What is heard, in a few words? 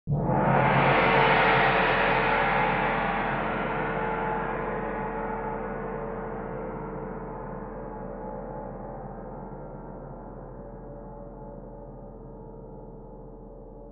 Tamtam; unmittelbar aufschlag; Instrumentenkunde